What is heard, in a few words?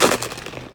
Ice